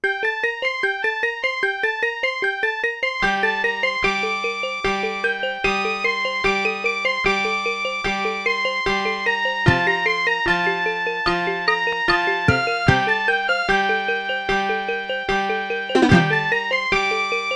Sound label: intro nvidia nv1 (roland); Nvidia NV1 (roland mode); soundcard test; silpheed